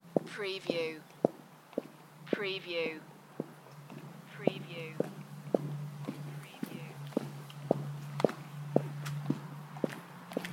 Footsteps on an urban path; beanstalk audio

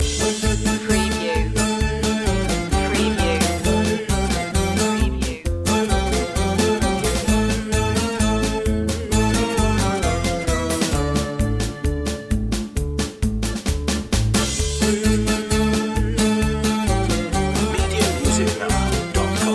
polka loop

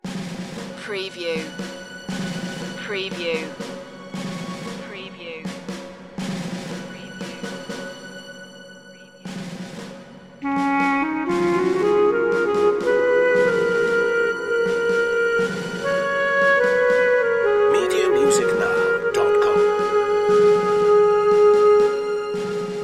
military snare version

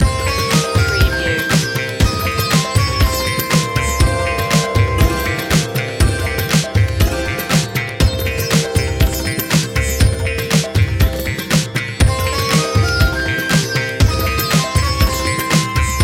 techno india loop

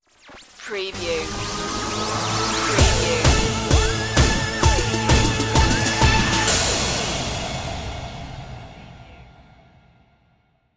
pop tv stinger

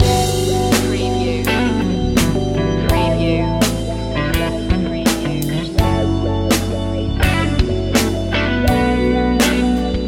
life grooves loop

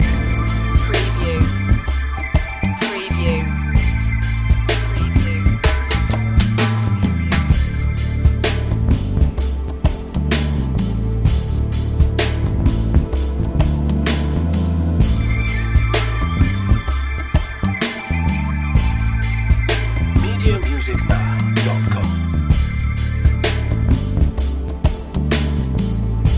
slowboat loop

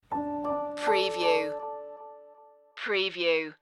ambient piano stinger

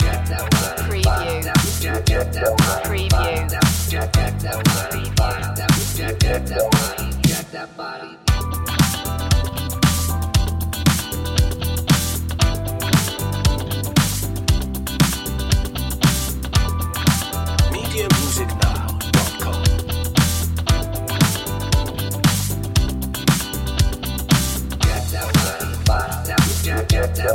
daft funk

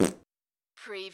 CARTOON FART SMALL

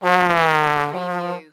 Cartoon Trombone Deep Note

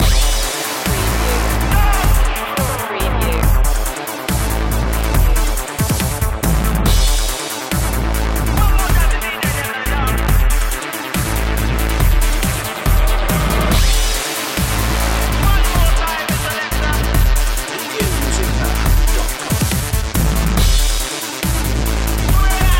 beats loop

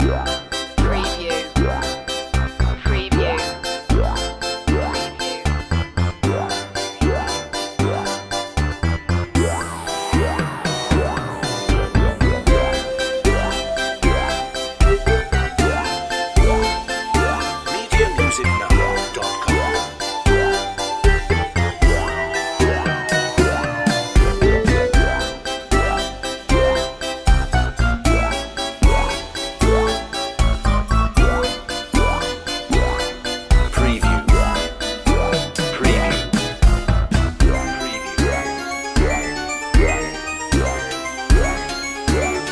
retro video game circus underscore

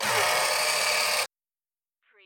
Pinball sound, motor or crane move long

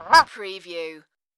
Duck Honk

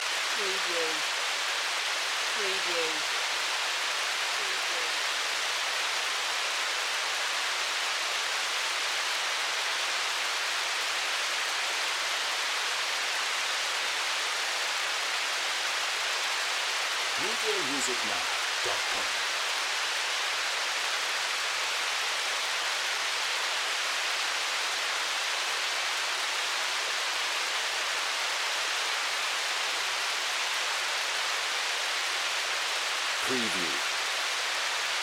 Rain Lake Light; Sound Effects Bible

